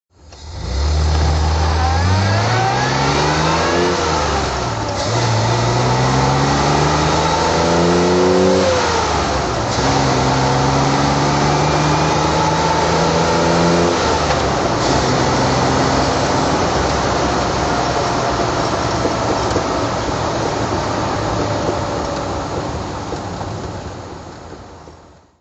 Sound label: healey 1006 drive